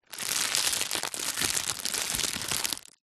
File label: foley plastic pouch small handling; zapsplat